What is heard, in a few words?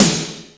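HYBRID snare drum, effected drum; Crypton - DrumBase5000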